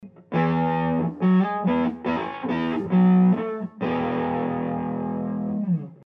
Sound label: amp no stand; Goodwill